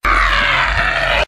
scream

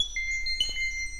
shrill, Loops Electronic

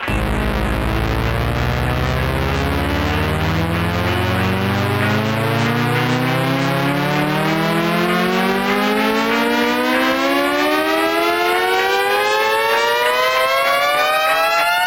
power, zaps and beeps

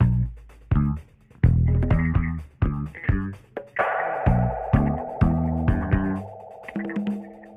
bass, loops; Phonky

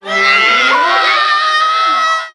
scream kids; ooh ahh

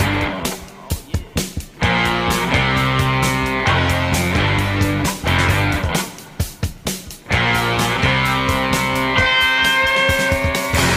guitar six bars, Phonky loops

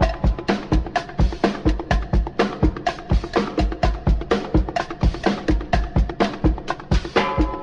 panned bongos, Drum loops, Funky

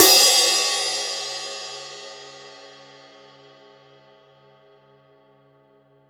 lingering, Cymbals

